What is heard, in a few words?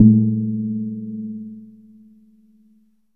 JAVA GONG A2; Spectrasonics; Heart of Asia